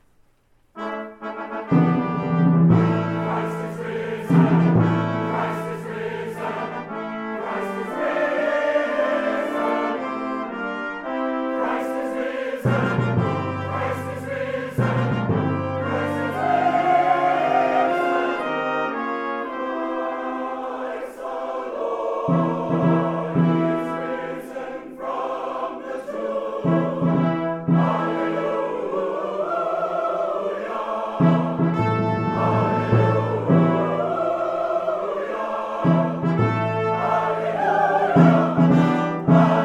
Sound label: Easter Fanfare